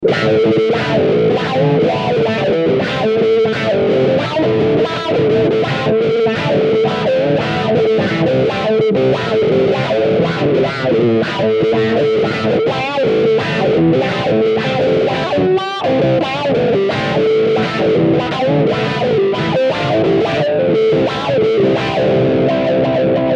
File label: Vision Wah gone wild